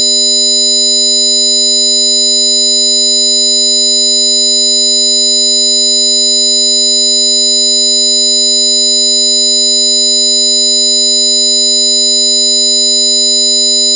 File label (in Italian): acufene terapia relax; acufenici; alcuni suoni acufenici